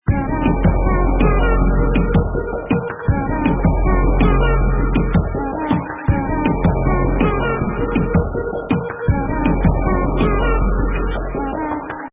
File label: happy; adafruit circuitpython bundle; macropad; macropad mp3